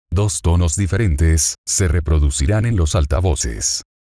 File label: Two Tones